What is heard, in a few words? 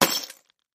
Picture Frame Crash; housewares